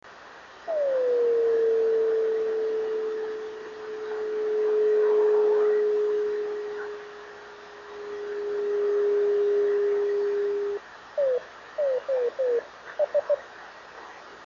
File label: driftnet beacons; TOS; 26.930